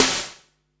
Claps & Snares

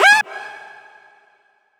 Tonal FX F#

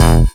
Synth Shot; Vengeance Samples

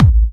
Bassdrums Trance, Trance Kicks; Vengeance Samples

